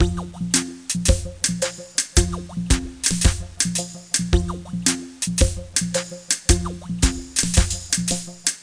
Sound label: loop